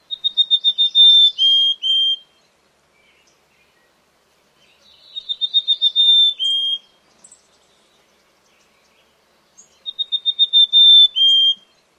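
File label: songs, mountain chickadee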